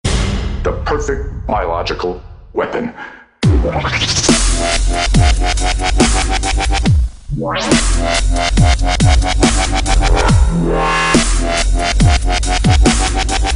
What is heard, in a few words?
Fine Dubstep